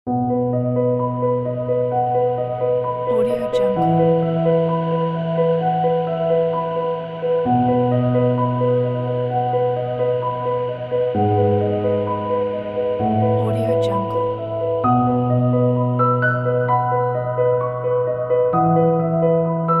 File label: ambience piano pack